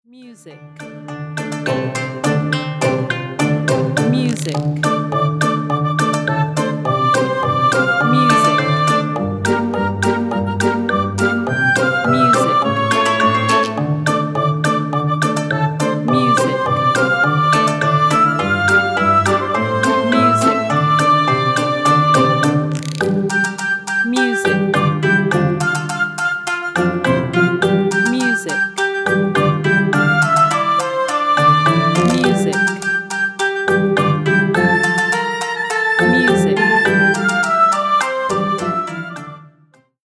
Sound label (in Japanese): YouTube動画用BGM